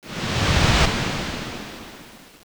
spy uncloak

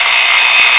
saw cut

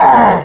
human bleed

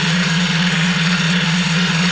rail electrified

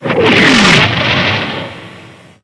portal hit

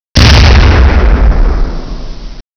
kabam

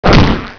claw strike

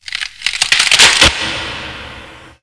ice break

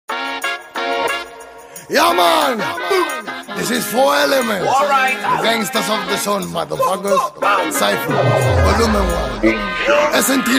reggae

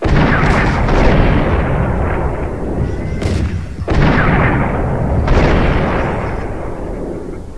battle

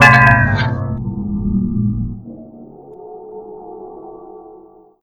scare jump out

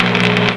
alien health

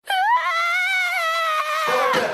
ahhh; UDK

